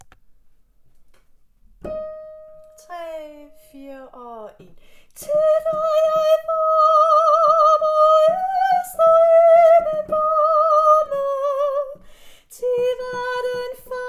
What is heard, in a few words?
SOPRAN; Tit er jeg glad